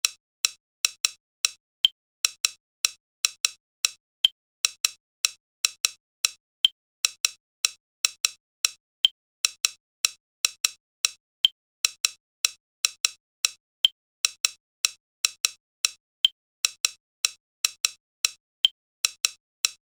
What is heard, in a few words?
Tick Phase